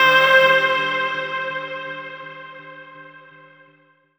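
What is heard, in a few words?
Guitar, Chords Tones; Equipped Music; Loungin House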